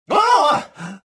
pushed, rodian

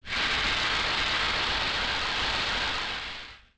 smoke, phos grenade; weapons; Soldier Of Fortune II Double Helix; XBOX

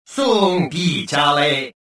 escaping

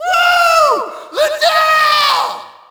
Shout, audience; UFC Tapout 2

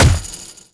paint roller impact

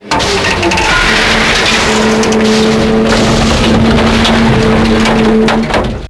helicopter platform creaking; Max Payne; penthouse

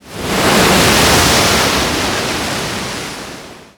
Waves Heavy, ambient; Totaled; XBOX

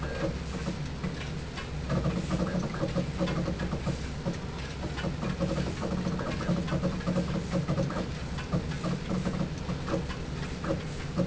Ambient; Sotha; Lab

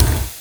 Controller Left Right

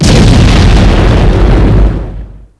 Large Exp, Explosions

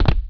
land step conc, walking; skater; Tony Hawk's Project 8; XBOX

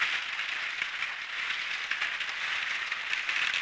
trees

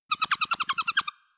Chipmunk; Animals; XBOX; BassProShopsTrophyHunter2007

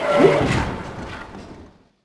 elevator exterior end; doors elevators; Star Wars Obi-Wan; XBOX

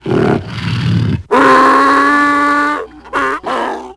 growl, creatures; tusken; Star Wars Obi-Wan; XBOX

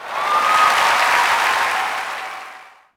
Cheer, Crowd, reactions

